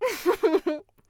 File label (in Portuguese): risos; osu